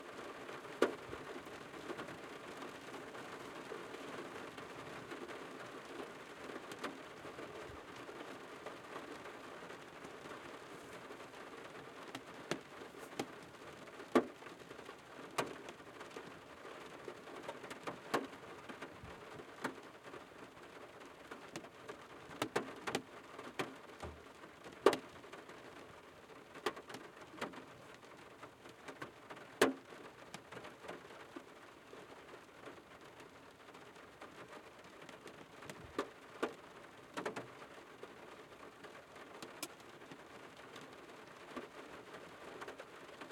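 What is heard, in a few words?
Rain Interior Car, Front seat, Moderate rain; Indoor Ambiences; Borg Sound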